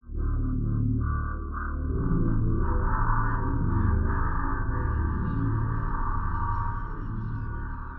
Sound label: Dark Synth, cinematic drama soundbeds; musicradar